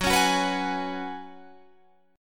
F sharp Suspended 2nd Suspended 4th, Guitar, Open G; chordshape; down